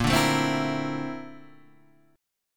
Bb Major Sharp 11th Bb down Guitar Half Step Down, chord